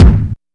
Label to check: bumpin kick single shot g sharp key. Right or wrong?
Right